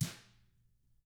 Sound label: TAMA, Drums; ILIO; Double Platinum Drums 1